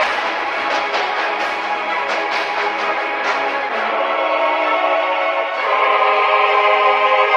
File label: strings and voice sudden choir, Sad; Loops